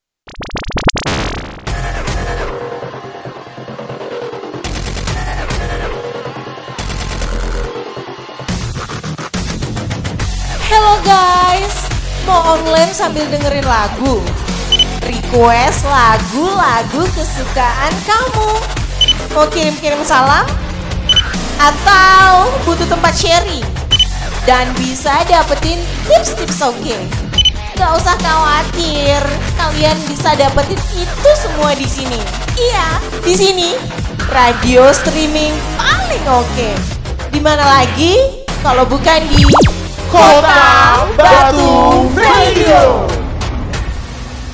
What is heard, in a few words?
Jingle Kota Batu Radio